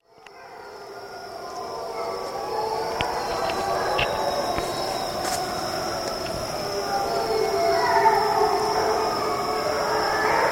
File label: wolf howls; nature